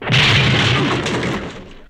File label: crash; Crash Bang Boom